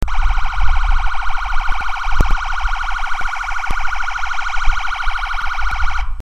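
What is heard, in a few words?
piercer, siren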